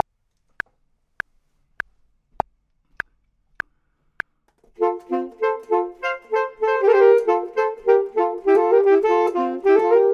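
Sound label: both, Saxophone and Clarinet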